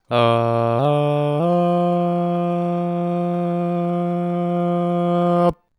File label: Vocals